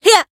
Voice attack; nagi

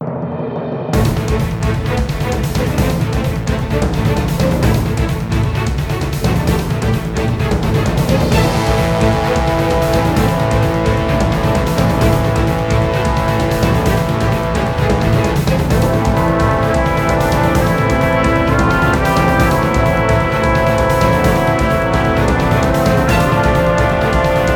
Bgm dungeon dimensional battleship

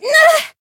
damaged

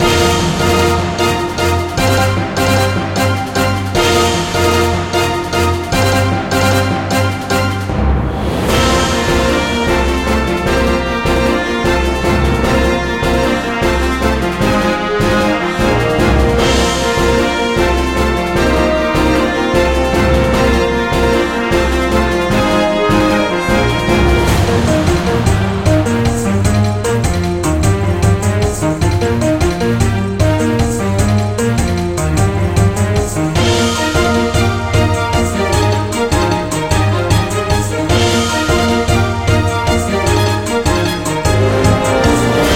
Bgm dungeon dragon; serial gaiden 3

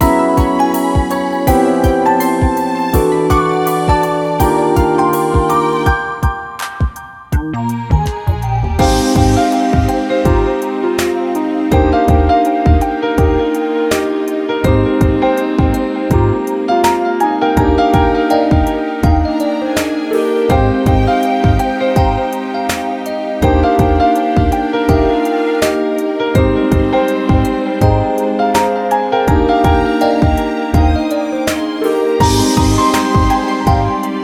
Bgm town

